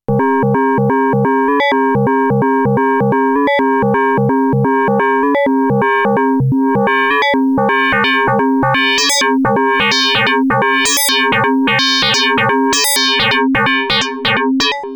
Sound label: FM env mod; MFB Dominion Club